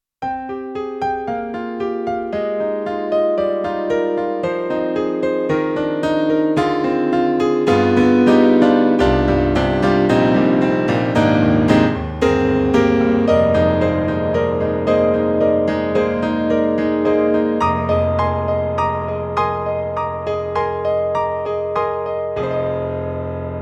YAMAHA CS1x, Grand Piano

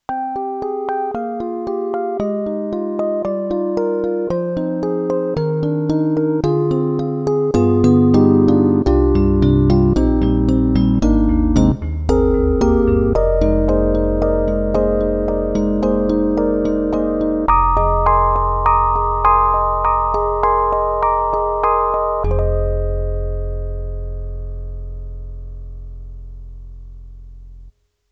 Yamaha MU1000EX Cheap EP

